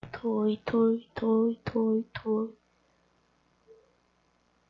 toy

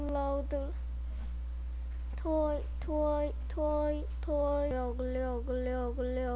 toy

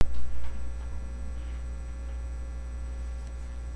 rake

